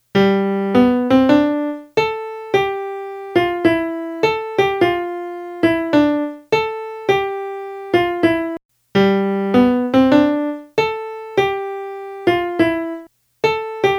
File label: melody